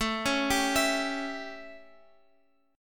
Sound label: A 7th Guitar Open F, chord; arpeggiated